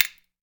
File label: SPOONS; Jew's Harp & Spoons